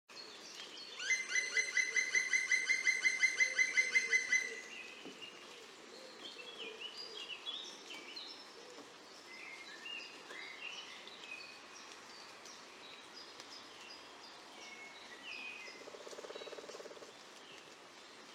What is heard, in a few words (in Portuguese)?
Canto do pica pau preto